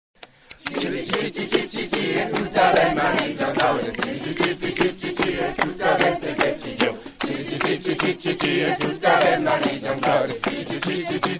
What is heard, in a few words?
Chorus